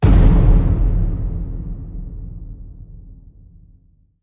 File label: Hit Low Hard Knock